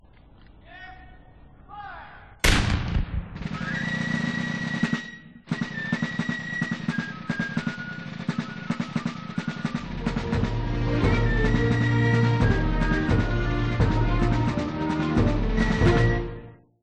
Victory

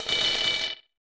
Coin Issuance; Hisshou 777 Fighter; SNES

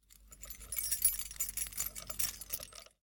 Key Fumble; The 39 Steps

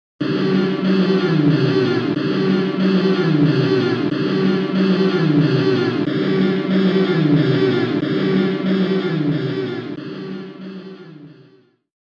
WARNING; Legend of Game Music Premium Box; Night Striker

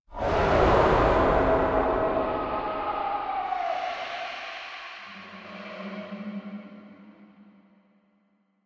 Eerie Distant; silent hill homecoming 2008 gamerip pc ps3 x360

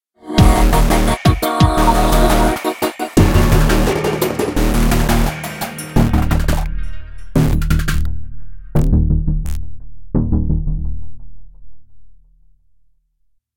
Fight Low Combo; Remember Me

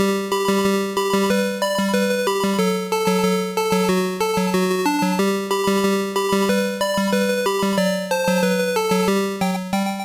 Taiko Performance Song

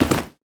Bodyfall Tile

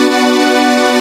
G minor Right; Angry Birds

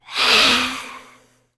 ghost witch voice hiss

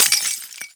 Object Jar; Inotia 3

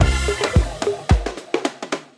club music fill; When Vikings Attack; psn ps3 gamerip 2012

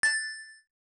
Credit Inserted, arcade; bad dudes vs. dragonninja

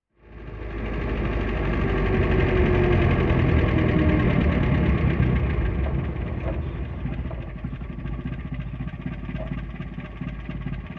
Car Riley Stop Slowly; The 39 Steps

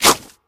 Bird Jump; Angry Birds

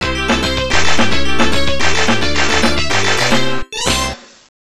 Round Clear Arrangement; Fantasy Zone; X68000

